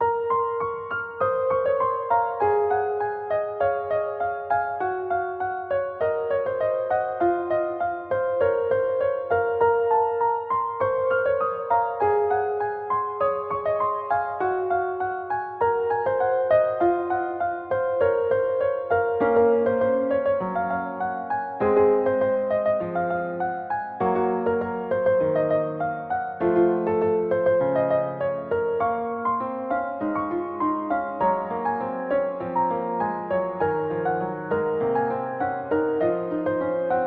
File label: Music Tutorial; Phantom Rose